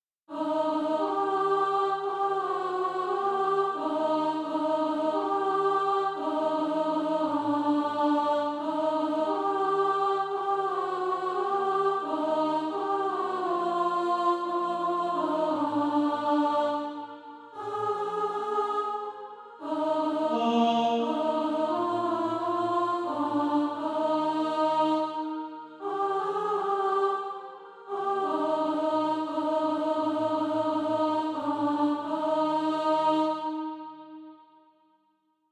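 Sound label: alto, Sheet music (SATB) including Practise Music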